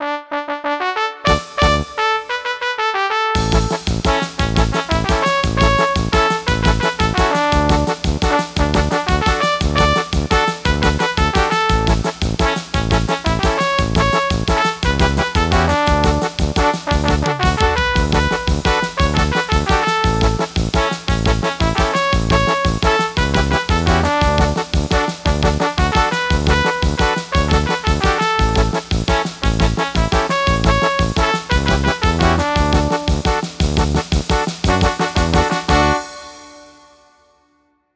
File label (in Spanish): Banda Electronica; Tierra Caliente